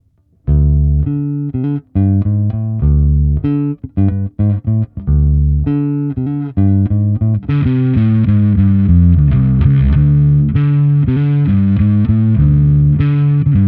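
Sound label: Clean dirty Cabsim, guitar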